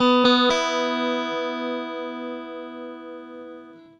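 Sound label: Slow Guitar Arpeggio; Guitars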